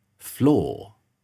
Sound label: floor